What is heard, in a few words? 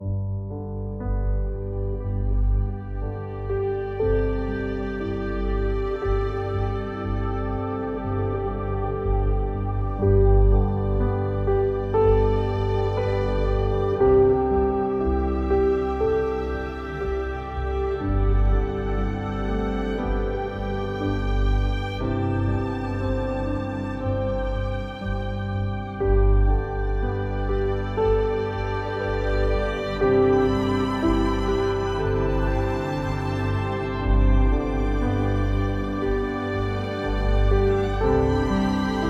Romance Ambient